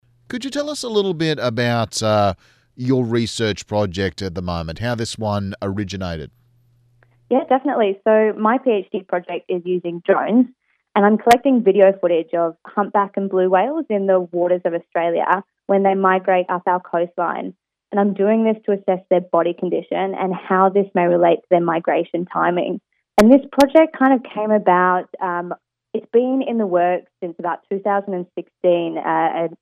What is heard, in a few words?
Whale Drones